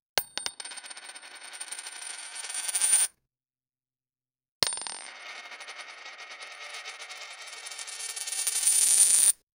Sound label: coins